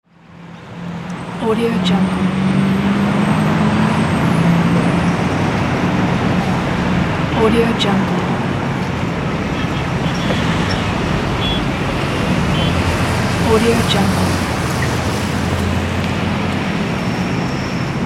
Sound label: urban ambience with traffic